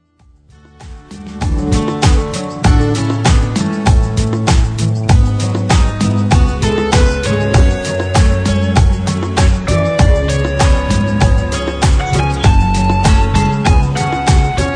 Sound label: Cumbia